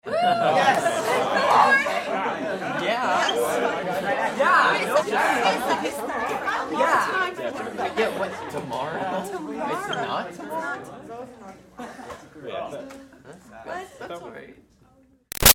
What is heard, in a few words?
Crowd High school Class Happy Reactions Yess; Backgrounds; Schools; Big Room Sound